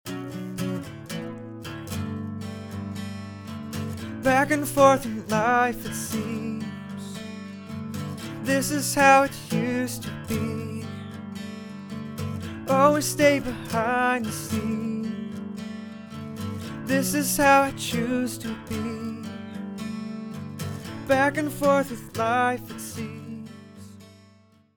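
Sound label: Singer With Guitar Dry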